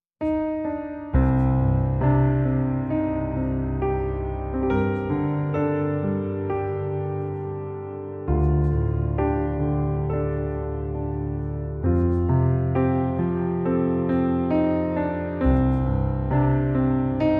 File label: Piano